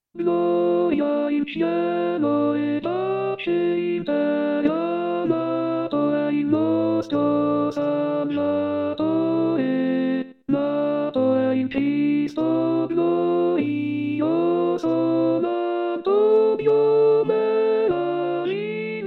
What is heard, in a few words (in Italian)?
Gloria In Cielo Contralti; natale